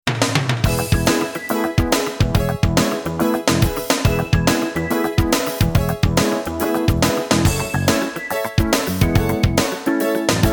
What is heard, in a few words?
Contemporary Medium